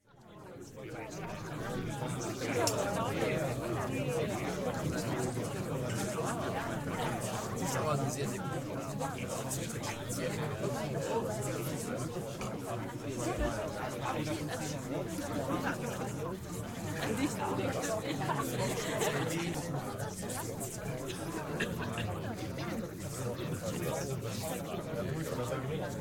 Tavern Loop Germans; SyrinScape; Waterdeep

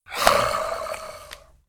Ghoul Growl; SyrinScape; East Ward

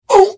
Hit; The Elder Scrolls III - Morrowind

